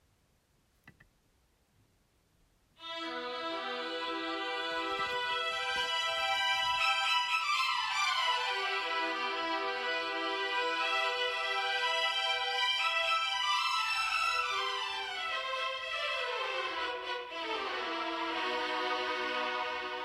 Intro and fugue for two violins

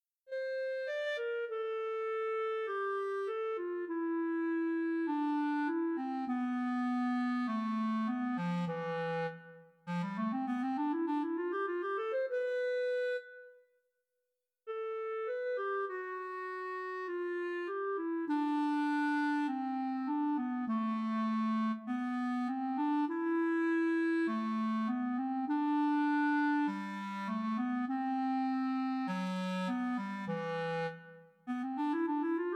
Soliloquy for Clarinet

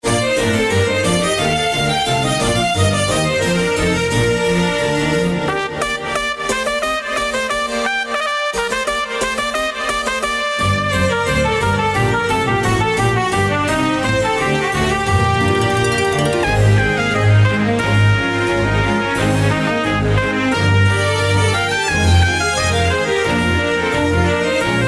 Baroque

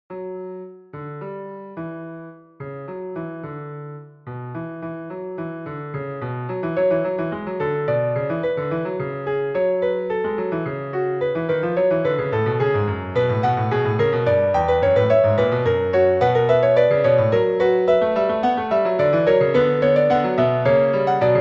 Minuets for the Piano

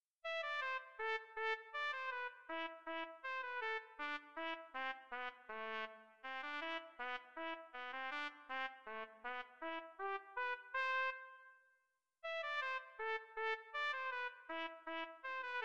Soliloquy for Trumpet

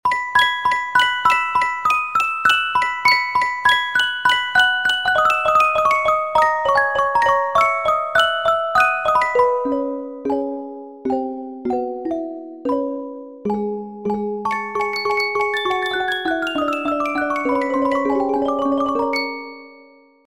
strange music box melody

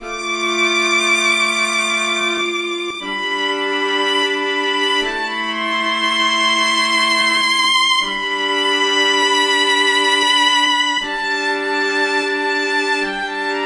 prelude var

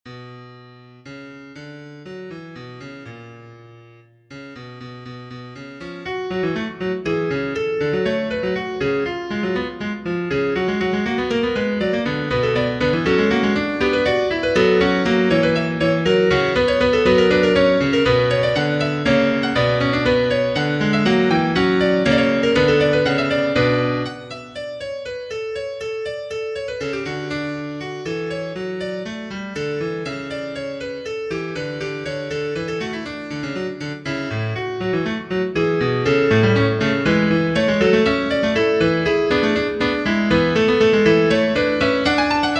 Minuets for the Piano; 26 Minuets for the Piano

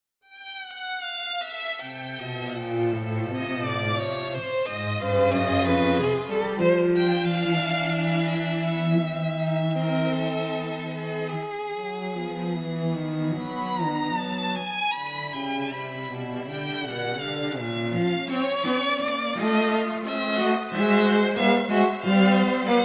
Microtonal String Quartet

